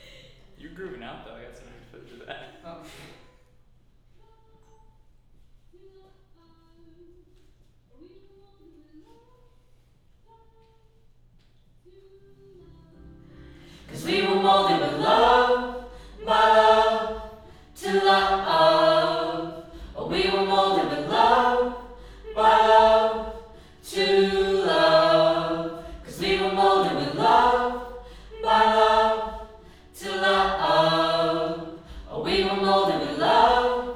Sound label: Choir L